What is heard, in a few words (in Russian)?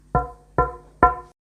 stuk po steklu